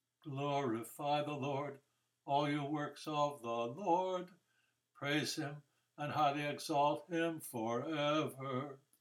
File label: Benedicite; NE Missouri